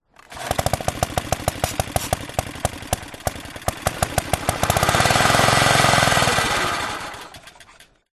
lawn mower vintage ride on British Anzani Lawnrider 24in Start Dies; selectmood